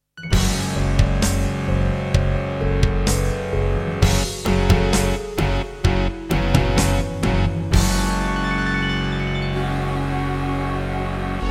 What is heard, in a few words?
Introduction, Alesis NanoSynth; Doom (Sigil)